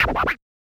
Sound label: SCR, Yamaha DJX 2B